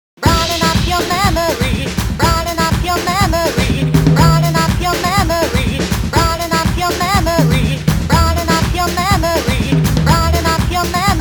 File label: Batucada de Tucano Mix